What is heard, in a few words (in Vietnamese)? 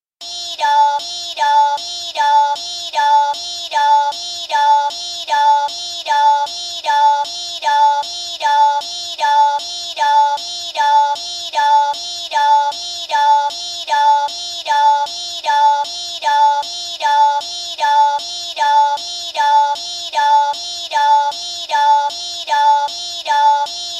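tiếng còi báo động minions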